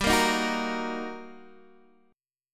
G Minor Major 7th Sharp 5th, down, Guitar, Open D

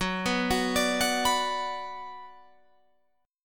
F sharp Suspended 4th Sharp 5th, Guitar, Open F; arpeggiated; 18,18,18,21,21,18

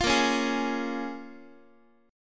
A sharp Minor 7th, A sharp, down, Guitar; strummed; x,x,8,8,5,7

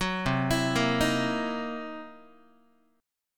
C 7th Sharp 9th Flat 5th, C, down, Guitar; arpeggiated; 4A,4E,5A,5D,5G#,6C#; chord; 9,8,7,8,7,x; JGuitar

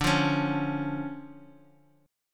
D Minor Major 7th D down; DmM7 strummed; JGuitar

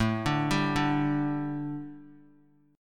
A 7th Suspended 4th, A, down; A7sus4 arpeggiated

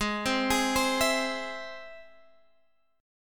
G sharp Augmented G sharp, down, Guitar, Standard; arpeggiated; JGuitar

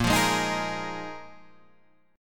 A sharp 7th Suspended 4th A sharp; A#7sus4; strummed; 6,8,6,8,9,6

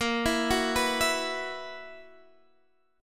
B Major 7th Suspended 4th A sharp

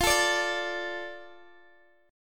F 6th Guitar Open G, chord; x,x,15,14,15,12

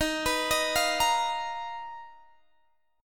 B Major Sharp 11th D sharp

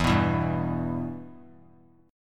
E-Minor; strummed; JGuitar

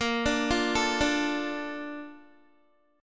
A sharp Major 7th, Guitar; arpeggiated

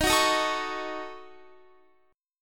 D sharp Minor 7th Flat 5th D sharp down Guitar; strummed

